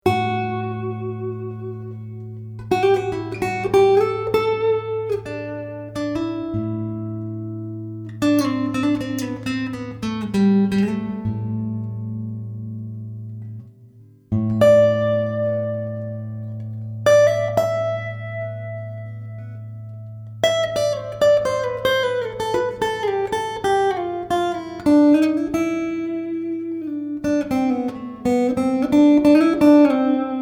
fingerstyle effects

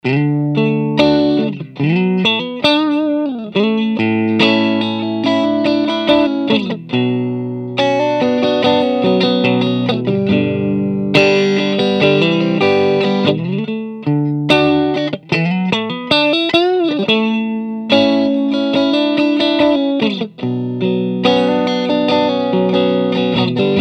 bambino normal edge grind, amps; Reason Amps “Bambino”

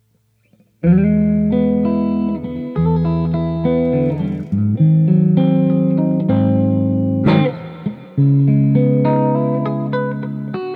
jazzy middle, Jazzy Blues w/reverb, middle pickup; tc electronic

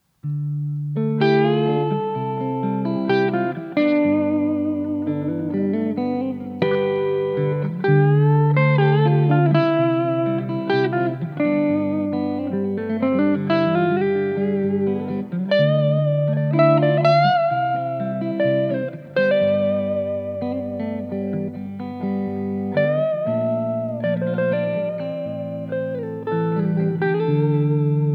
clean, Gibson Les Paul 1958 Standard Reissue; Gloss; Tea Burst

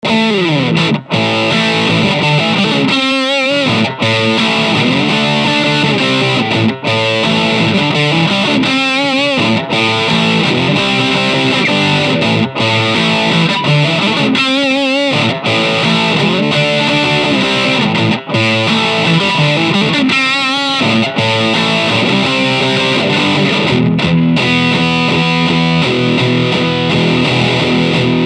bambino stack wide open; Reason Amps “Bambino”

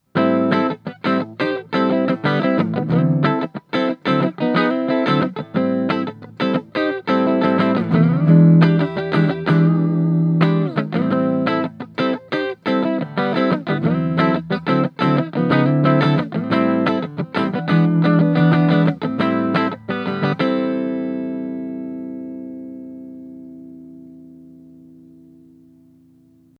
funky neck, Clean Funk; I just love the fast attack of these pickups. The clean tones are right in your face, but not off-putting at all.

